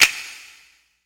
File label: Snap